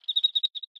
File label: Env Void Insect Sing